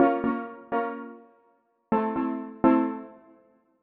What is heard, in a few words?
DEEPHOUSE ORGAN 125 A